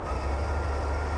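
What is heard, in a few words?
Cockpit Airbrakes Open; PC Gamer IT CD 47; USAF; USAFDEMO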